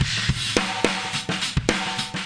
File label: blow my head off gate, drum loops and breaks, 106 bpm; 101 to 110 bpm